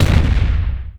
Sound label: Robot Hit